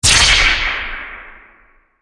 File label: Horror Magic Wand Blast Shot